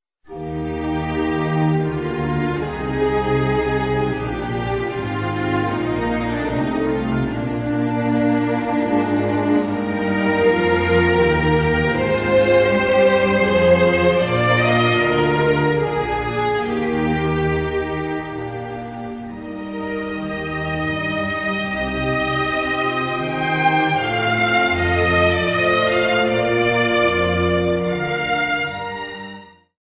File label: Minuet (strings)